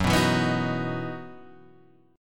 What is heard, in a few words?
E Major 7th Suspended 2nd, F sharp